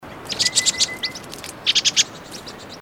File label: Rufous capped Babbler, birdcall